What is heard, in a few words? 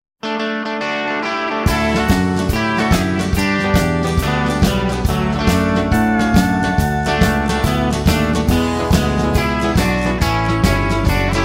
Honky-Tonk